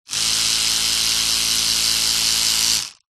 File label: household electric toothbrush on run short off no brushing